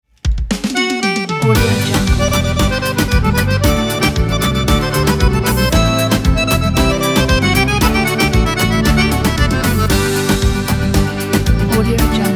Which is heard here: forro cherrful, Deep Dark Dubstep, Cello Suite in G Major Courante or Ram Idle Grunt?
forro cherrful